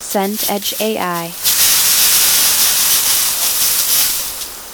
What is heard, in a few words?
rain forest canopy